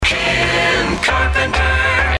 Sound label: jock jingle; ken carpenter